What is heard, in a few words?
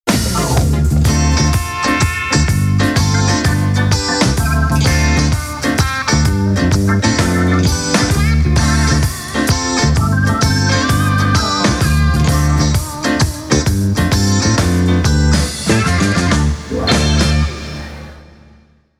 Jingle